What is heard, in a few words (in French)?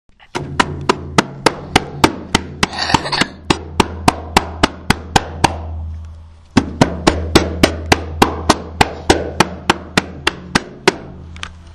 tambourin